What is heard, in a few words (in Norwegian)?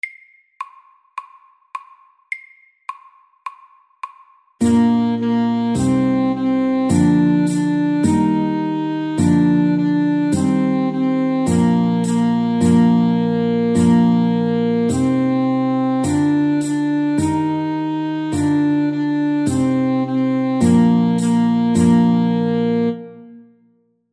Folketone MEDIUM 105